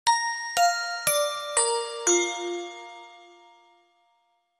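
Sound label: dinner chime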